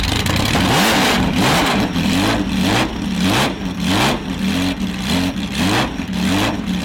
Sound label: ferrari; shell; carface